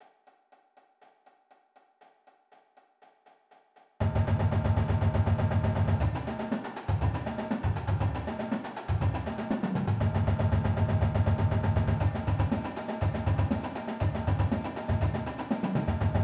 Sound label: Stick control, percussion